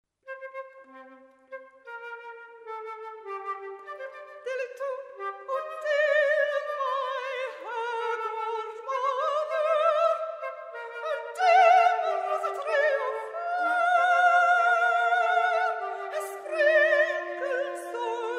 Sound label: mezzo, flute and piano; sung in English